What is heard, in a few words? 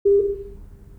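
0deg, 400 Hz tones with 50 Hz sinusoidal amplitude modulations; Binaural recordings; played from a loudspeaker in my dining room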